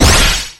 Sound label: magic hit; smash bros